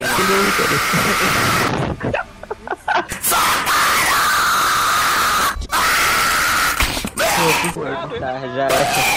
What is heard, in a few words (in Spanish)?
grito